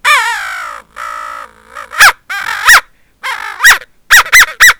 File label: dog